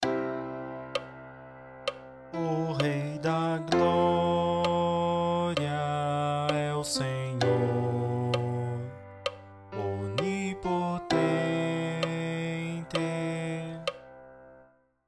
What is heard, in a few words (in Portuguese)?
Coro